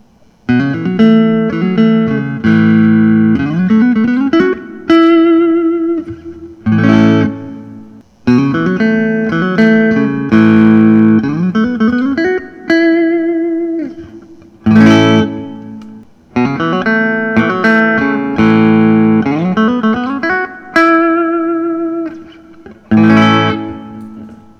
fender custom shop 63 telecaster nos

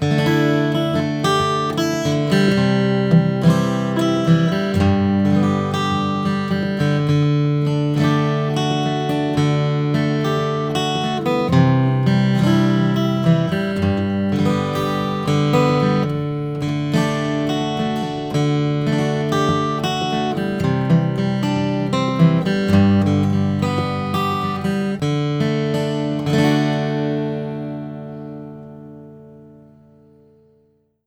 martin custom d 28 12 fret alpine

